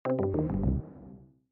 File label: game lost; keezers